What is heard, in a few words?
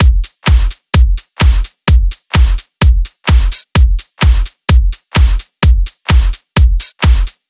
128BPM Drums; Uno; Vengeance Samples; Uno Kit